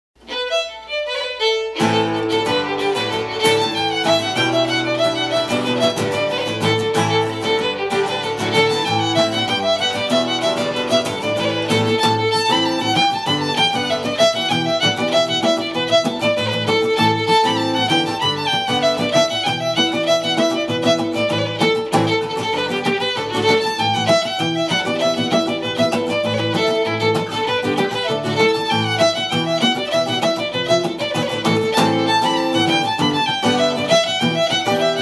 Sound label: Sheepskin and Beeswax